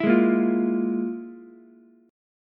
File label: F Minor Major 7th Double Flat 5th, Ukulele; FmM7bb5 strummed; JGuitar